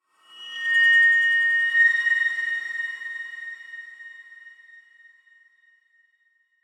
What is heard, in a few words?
Metallic Swell Scrape Delay; Metallic Sound; Blastwave